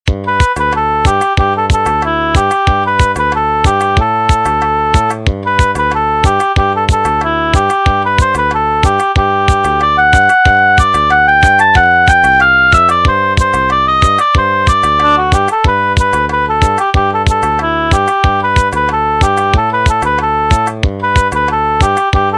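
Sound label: instrumental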